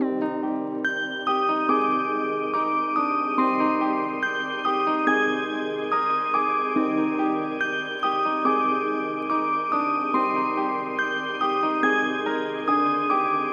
Melody Example

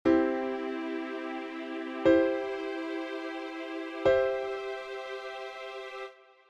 C Maj All Inversions

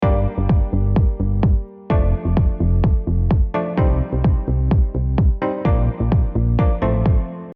Chords Not so simple Bassline kick

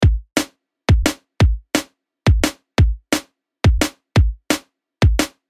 Kick and Snare

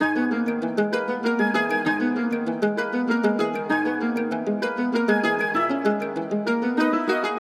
Type Beat Melody

